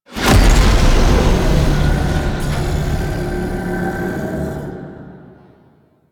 Alchemy Fully Filled Stinger; Warframe; Entrati

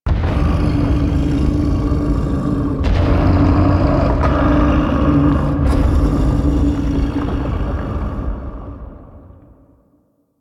Shaking Lab Rumble; ambience; Quest; Warframe; Entrati

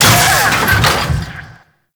Necramech Ranged Hit React; Warframe

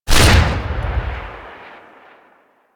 Glass Shotgun Shot; Warframe; Tenno; Weapons

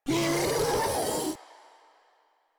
Infested Ancient Vocal Attack

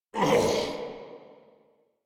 Death, Grineer Male

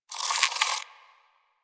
Rodent Vocal Snore; Warframe; Hunting Critters

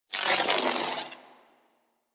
Ball Held Spark; Missions; Tenno Ball

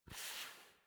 Pet Snort; Enemies; Warframe; Catbrow; PetShip

